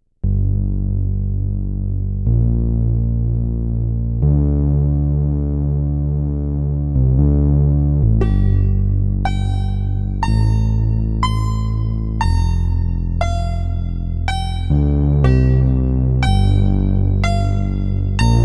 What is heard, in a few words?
Minibrute